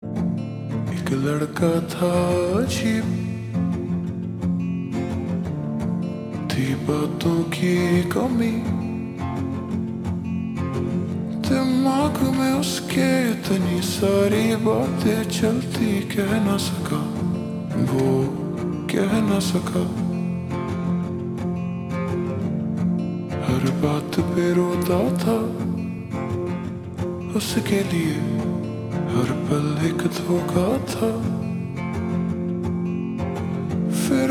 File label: Slowed Reverb